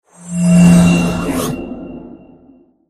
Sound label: up success; kami